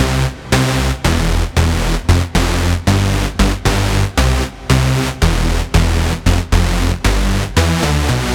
King Hoover

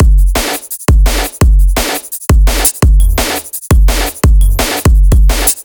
Rumble Beat C, 170 bpm

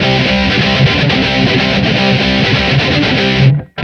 Rocket, big beat

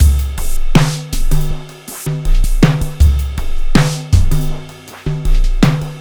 Jazzy Set; Ударные и барабаны (Downbeat); 80 BPM; Downtempo; Drums; даунбит

